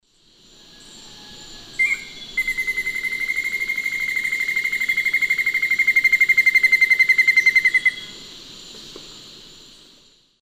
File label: Formicarius analis, call and song; S. America; Eastern Lowlands